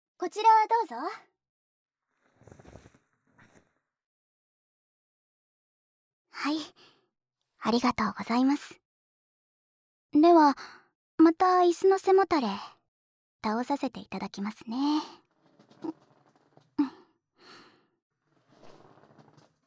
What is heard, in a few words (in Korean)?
ASMR 헤드스파